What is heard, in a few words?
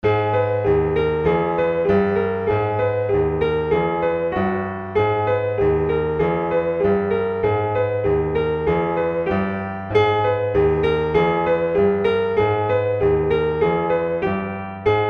16 ET